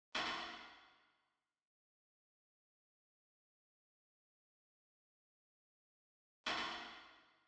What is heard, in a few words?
152 BPM G# Min Accent; Loop Stems; Cymatics Gems Vol 10; Passion; Gems Free Teaser Pack